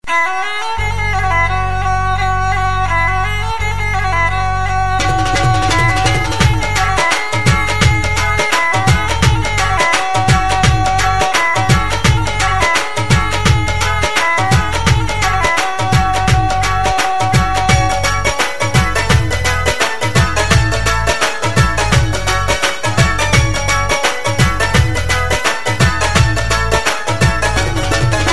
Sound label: Punjabi Folk Ringtone